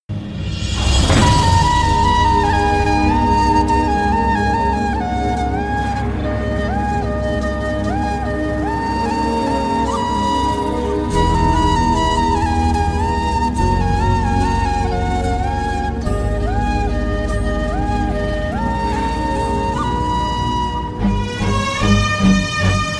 love failure bgm; jayam ravi

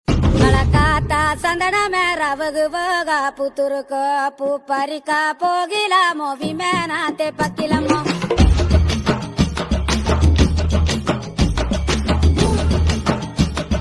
trending bgm ringtone

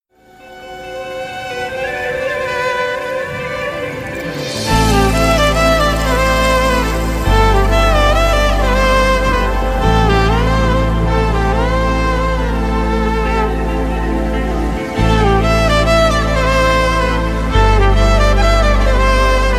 movie music; 96 movie

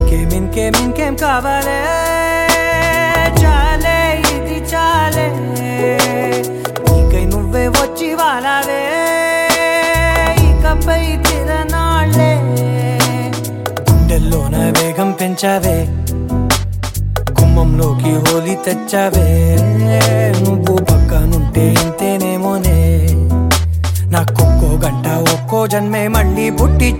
ringtone; telugu

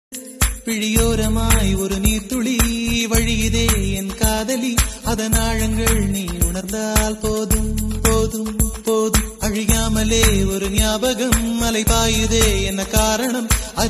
sad ringtone; kadhal konden

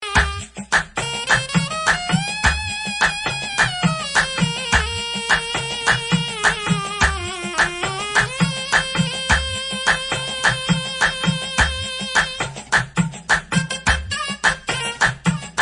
bgm ringtone; tamil; r k nagar

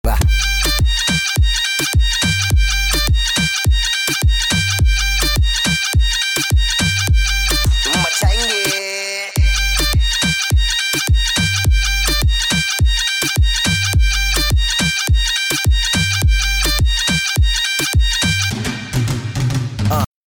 instrumental ringtone

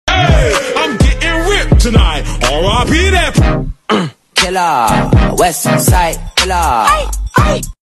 trending bgm ringtone

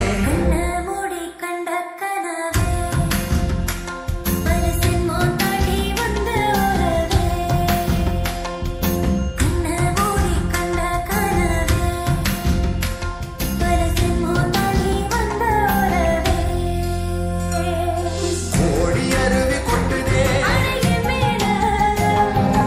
tamil trending song ringtone

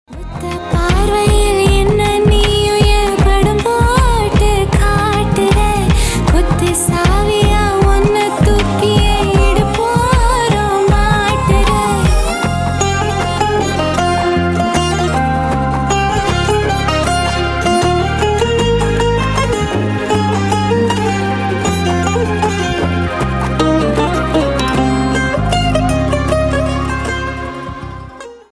female; tamil; kadamban

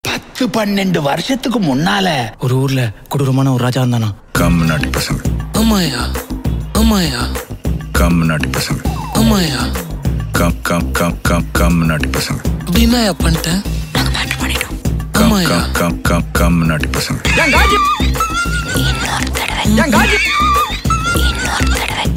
ding dong promo ringtone; tamil ringtones; super deluxe; vijay sethupathi

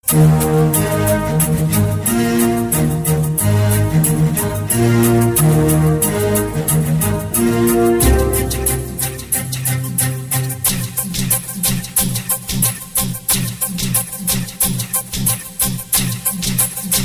bgms ringtones; tamil; indian 2

